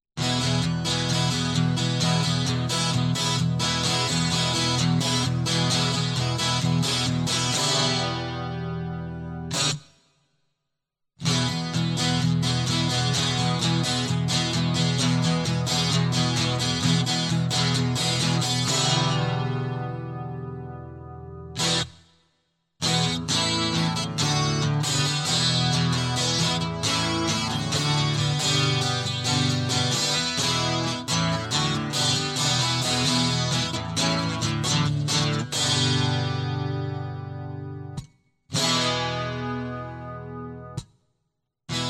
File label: Guitar Loop